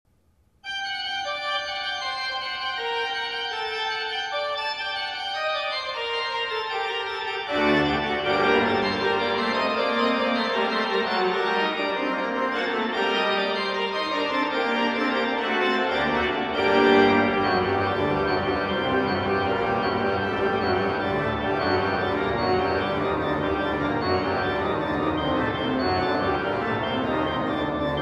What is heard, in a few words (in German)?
Praeludium in e-moll, Orgel; Bruhns